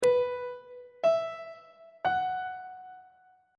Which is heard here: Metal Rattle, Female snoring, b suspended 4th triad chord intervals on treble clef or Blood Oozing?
b suspended 4th triad chord intervals on treble clef